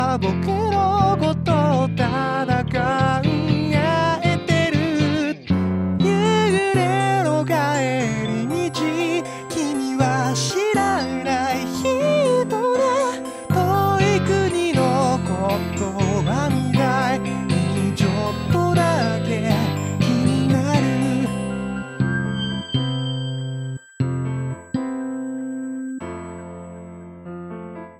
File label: bass guitar pads